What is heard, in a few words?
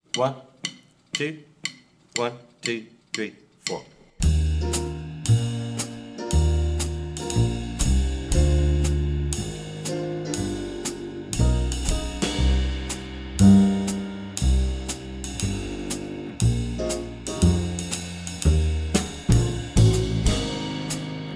swing etude piano muted